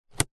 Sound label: vehicles suv fan direction vent slide click nissan patrol 2019